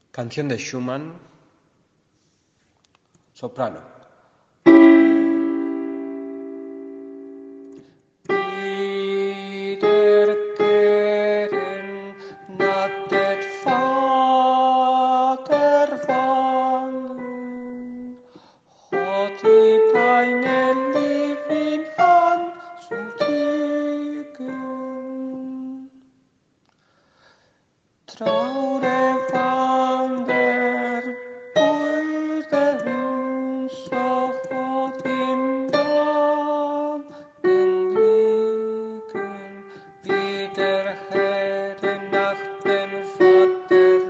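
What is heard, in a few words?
soprano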